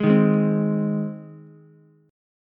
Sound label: Ab Major Ab down Ukulele; strummed; JGuitar